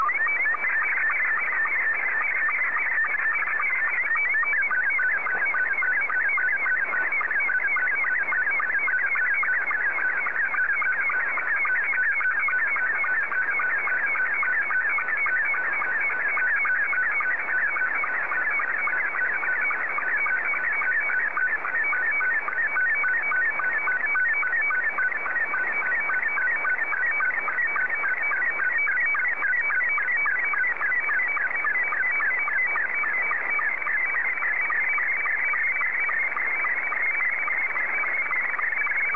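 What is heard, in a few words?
SSTV, Scottie 2; JA3QJQ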